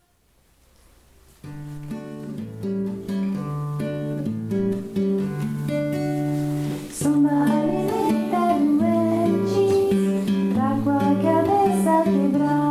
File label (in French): Samba Lélé